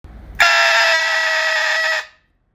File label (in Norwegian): Jakthorn lite